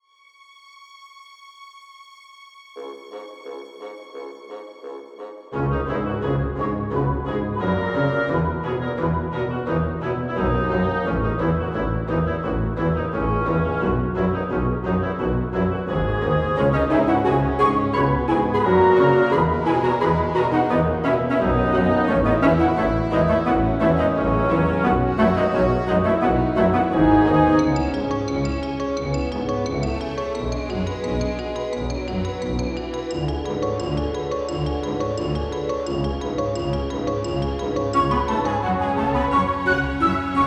Orchestral Arrangement; Square Enix Powermix 2023; Super Mario RPG

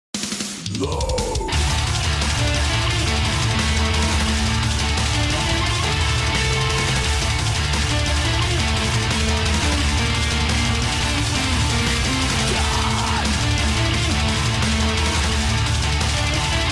Apartment Metal; Catlateral Damage Remeowstered

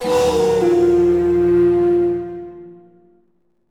Jungle Bankrupt; Monopoly; PSP gamerip

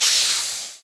Snakewarrior Recoil; Puzzle Chronicles; PS3; PSP; X360

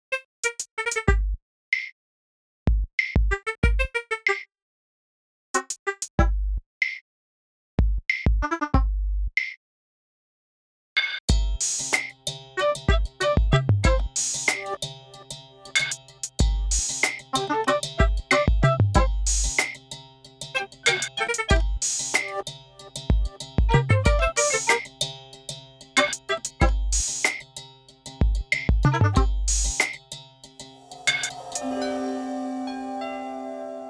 colorlab bgm loop 94bpm CMaj

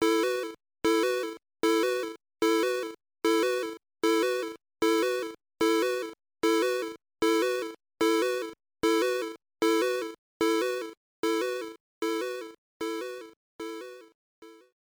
Item Unlocked; Back to the Future II & III; nes

